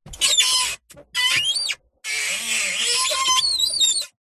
household cupboard door roller squeaky slow movements; zapsplat